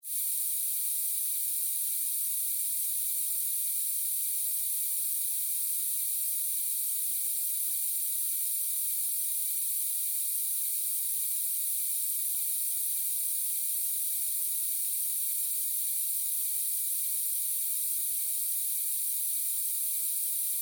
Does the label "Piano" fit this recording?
No